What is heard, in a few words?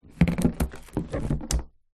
balloon tying up; foley